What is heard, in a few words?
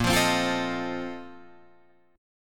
A sharp Major 7th Suspended 2nd Suspended 4th A sharp Guitar Drop D